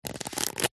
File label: household hairbrush plastic bristles finger run through; zapsplat